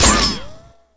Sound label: metal, debris